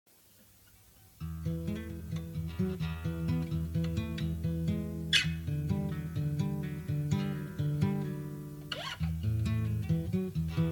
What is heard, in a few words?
Guitar Pluck Soft Metal